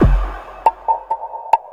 percussion loops 138, Techno; Best Service ProSamples vol.45 - Techno ID; PS-45

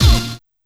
Uh! + Hit

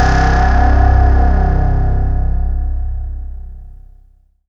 C2, Synth Line; NiceBeats

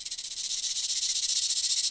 PRC, AFRICAN PERC; Roland LA

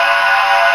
Aud Wall A#3; Digital Sound Factory Xtreme Lead

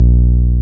TB303, TB-303 BASS

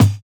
Kick, jungle breaks drum sounds

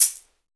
CB, 2 Step; USB Soundscan vol.51; House Side Of 2 Step; AKAI

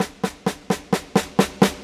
Snare, Orchestral, Rock Pop; Sonic Foundry (Sony Creative Software); Orchestral Series VOL-4 - Rock and Pop

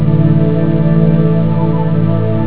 pad sus4; jazz jackrabbit 2

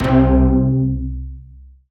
SYN, DANCE SYNTH

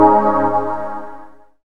SYN DANCE; Sound & Vision - Gigapack II CD 1

